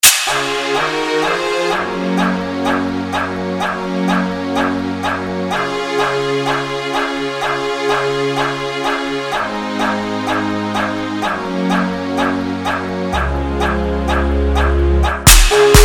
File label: Trumpet Mixture